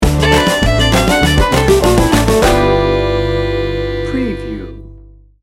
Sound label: country folk two step sting bumper